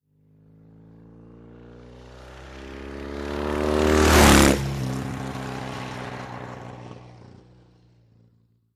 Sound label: Motorcycle, Norton, Accelerates In Through Gears, By ( 55 Mph ).